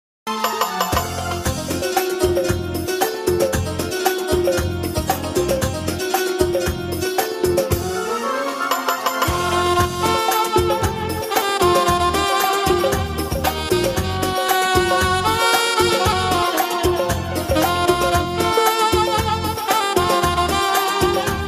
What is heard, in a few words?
call ringtone saxophone music saxophone song saxophone ringtone saxophone instrumental